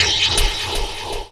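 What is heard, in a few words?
HH, hi-hat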